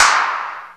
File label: EXP CLAP